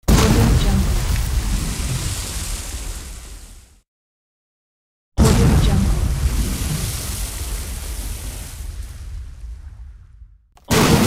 water explosion

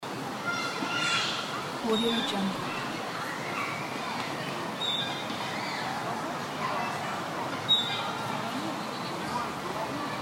playground background noise

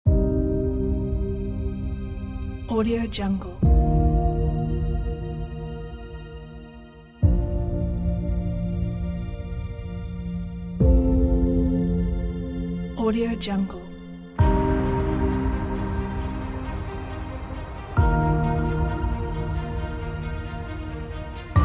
the emotional inspiring trailer

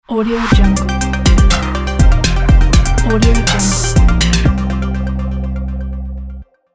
modern bubbles logo

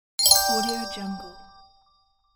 win sound; danielmix

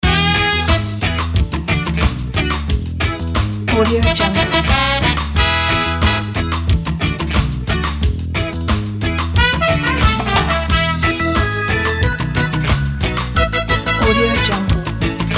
jamaica vacation

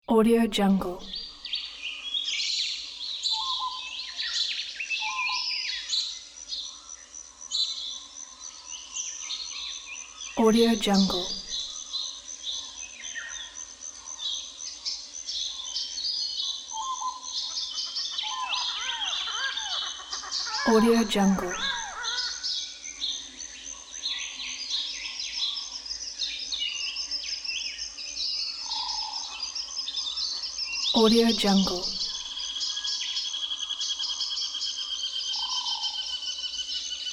forest ambience

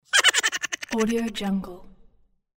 funny laugh

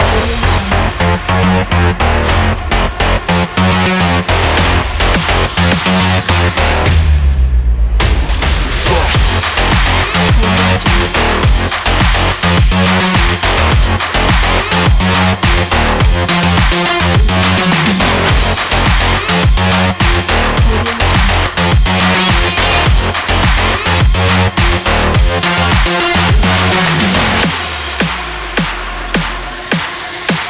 dubstep; dubstep in

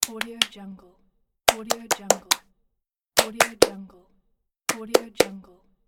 the patting belly

